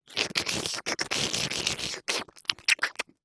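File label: slurping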